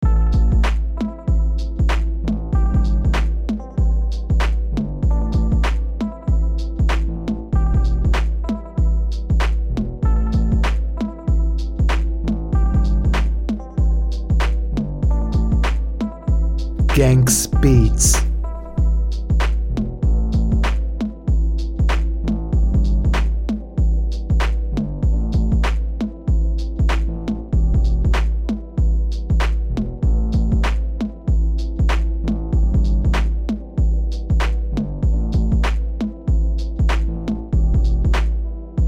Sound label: emotional sad type rap beat